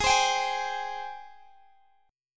G sharp Major 7th, chord; strummed; x,23,22,24,21,x; G#M7

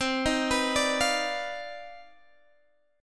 C Minor Major 11th C down Guitar Standard; CmM11 arpeggiated; JGuitar

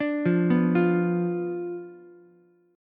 B Diminished B down Ukulele; Bdim arpeggiated

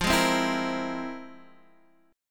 F Minor Major 11th, F; strummed; JGuitar

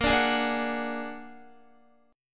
Bb Augmented 7th Bb down Guitar 4F,4A,5C,5G,5C,6E; Bb+7; strummed; JGuitar

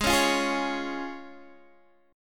Db Major Ab

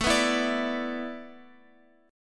A Suspended 2nd Suspended 4th, Guitar, Open F; Asus2sus4; strummed; x,x,9,6,7,9

